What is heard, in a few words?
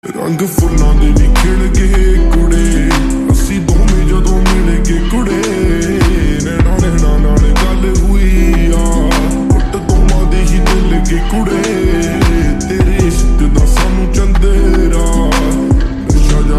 Slowed